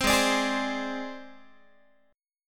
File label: Ab Major Flat 5th Ab Guitar; AbMb5 strummed; 15,16,17,18,16,x